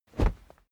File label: whoosh cloth soft dirty